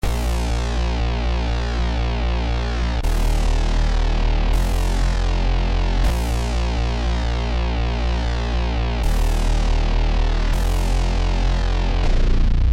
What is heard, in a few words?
80 bpm synth